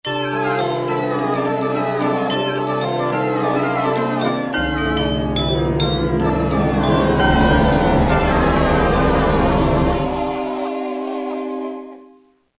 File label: mystical; Roland M-DC1